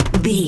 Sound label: footstep metal land